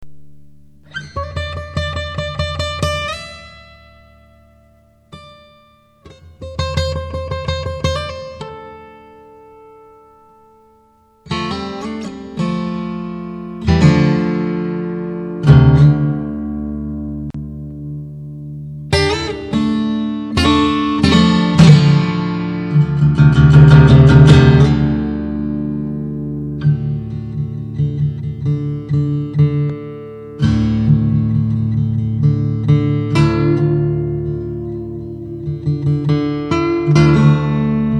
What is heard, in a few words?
lullaby